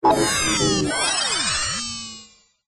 science fiction machine or robot glitch

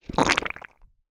human swallow wet squelchy close up; sound effects one

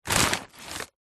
foley paper pile loose movement

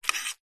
food cereal bowl single spoon scoop

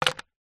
sport cricket bat set down concrete; zapsplat

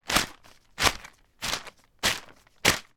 small plastic flag short sharp movements; foley

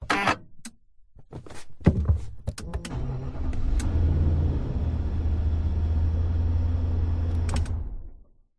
car start off internal perspective nissan patrol; vehicles

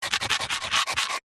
stone small scrape on concrete paving slab; foley

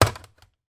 household sandwich toaster lid down; sound effects one

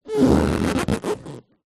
balloon inflated handling squeaks; foley

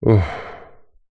human breathe out relief; zapsplat

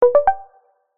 notification tone soft subtle

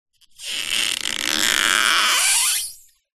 office plastic squeak stretch adhesive tape

